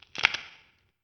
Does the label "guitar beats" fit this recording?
No